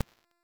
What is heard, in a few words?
Infested Oni Melee End; Warframe